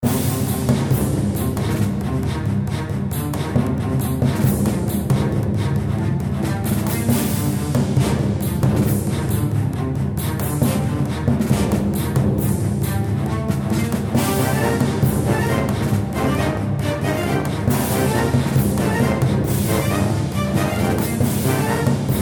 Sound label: Battle; kult